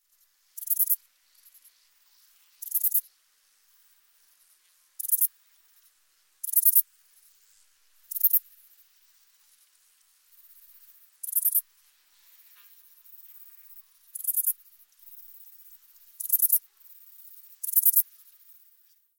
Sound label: Ephippiger ephippiger